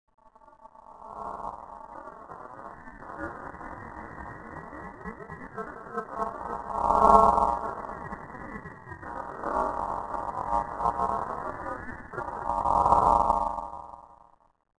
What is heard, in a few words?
Noise; Revista CD Expert 50